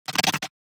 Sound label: Emote; Evolve; Gorgon